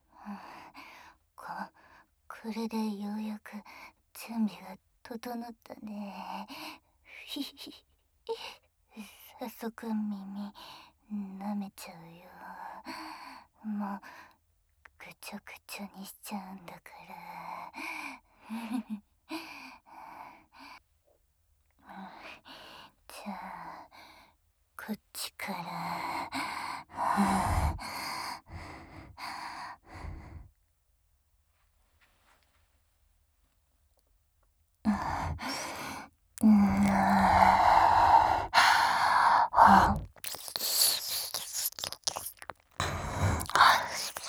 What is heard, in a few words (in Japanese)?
耳舐め(耳吸い)